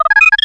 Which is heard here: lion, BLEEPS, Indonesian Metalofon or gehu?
BLEEPS